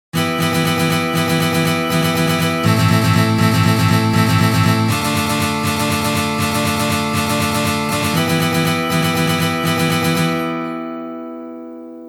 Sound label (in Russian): CHechenskij boj na gitare